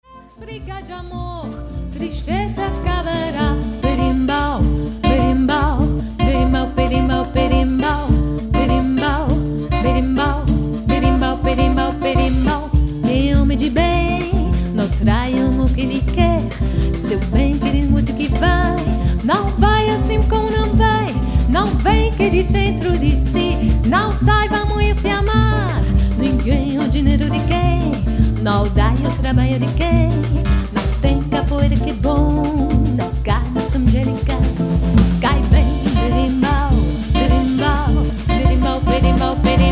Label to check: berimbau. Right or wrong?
Right